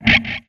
Music Muted E Guitar

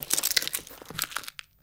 Book Crackles

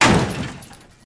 Jeep Crash Brief, car crashes

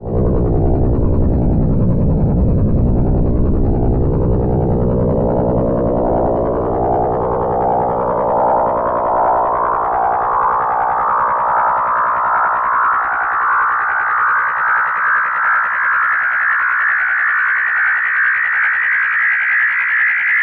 forcefield

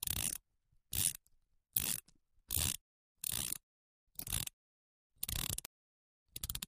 Multi Rachet, ratchets

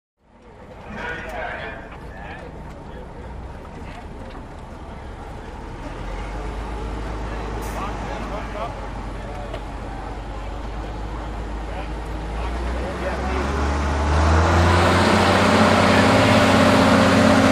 Hydroplane Dock cra

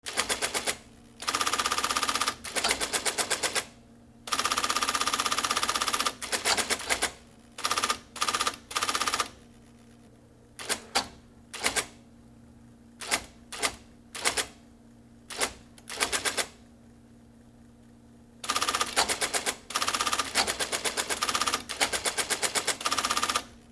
Electric Typewriter; typewriters electric

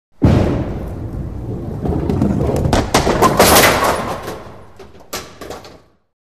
Bowling Ball Rolls

